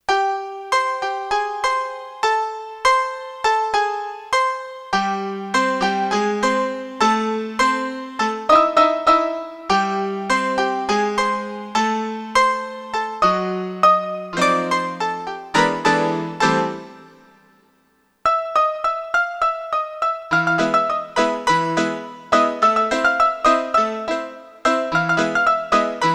Mix Piano; KSDU-013 Combined Groups

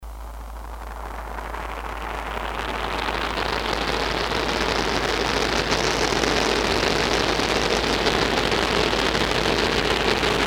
Rain, Korg DS-8; Factory Patches

